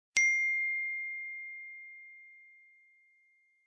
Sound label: iphone message sound